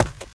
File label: concrete, footsteps infected walk; player